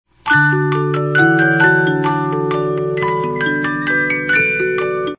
Minuet No 3 Bach, 50 Note music box

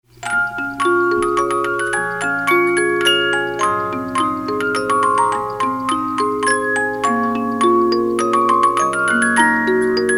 Menuet, 50 Note music box

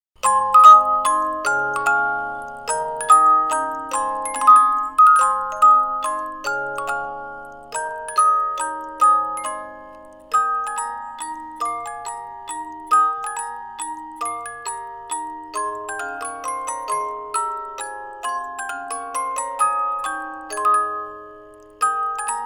Poorly Tuned, Music Box; Gaudeamus Igitur

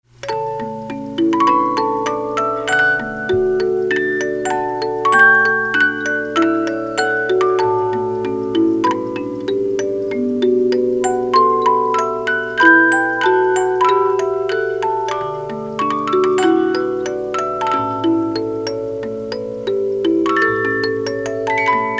Fantaisie Impromptu Op 66, 50-Note Movements

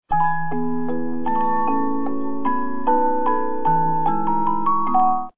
Valse Brillante, music box 50-Note

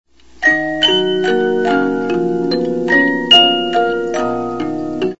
Minuet, music boxes, 72-Note Movements; Fireworksmusic

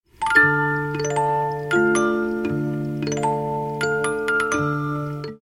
50 Note music box

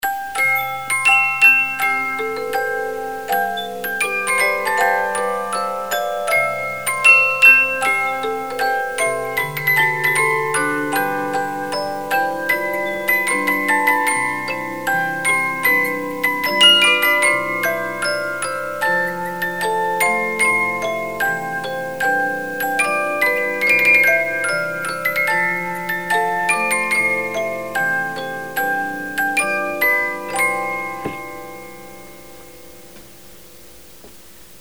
The Trout, 72-Note Movements

